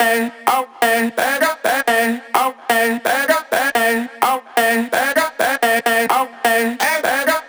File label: House Loops, Vocal Loops House 128BPM